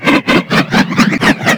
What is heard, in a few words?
Hammer Weapon Throw Loop